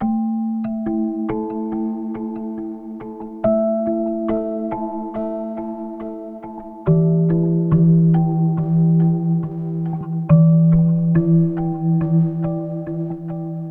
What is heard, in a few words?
Soft Rhodes Loop Cm 70Bpm, Granular Lo-Fi; Granular Musical Loops; Ghosthack Advent Calendar; Lo-Fi Kit